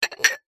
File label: household glasses drinking x2 stacked inside each other remove; zapsplat